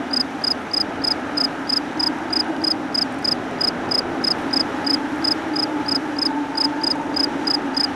Crikit; leisure suit larry magna cum laude

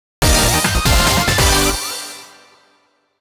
Goal; Bike Rider DX2

